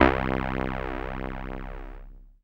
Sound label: SIEL, String Machines